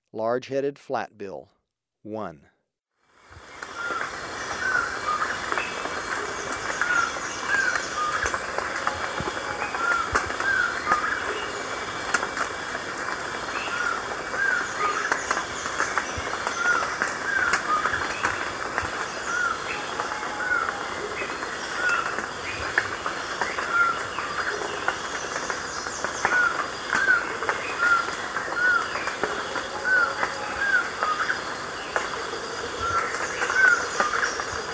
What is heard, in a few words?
Large-Headed Flatbill; S. America